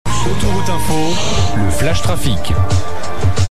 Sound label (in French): Jingle Flash trafic; Autoroute Info